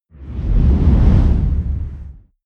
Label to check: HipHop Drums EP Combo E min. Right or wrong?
Wrong